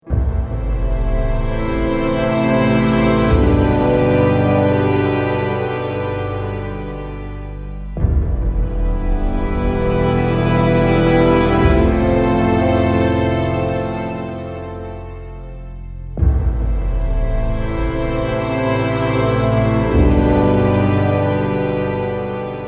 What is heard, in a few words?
Ceasefire, Easy orchestra loops